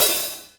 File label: Hat Semi Open Hardest; hydrogen drum samples